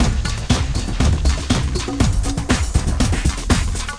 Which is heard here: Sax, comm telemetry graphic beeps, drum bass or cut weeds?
drum bass